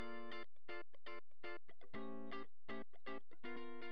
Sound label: wet guitar funk; Morphdrive; Demos for hybrid configurations